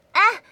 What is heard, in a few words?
Hurt Ahh